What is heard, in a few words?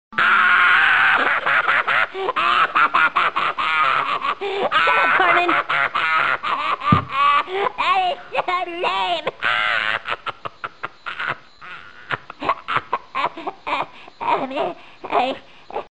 Cartman laugh